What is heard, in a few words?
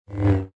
saber; Dark Vader